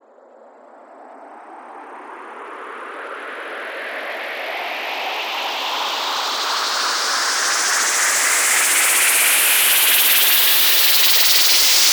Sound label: Rise; SFX; 7rystan's 600+ FREE EDM SAMPLES & DRUMS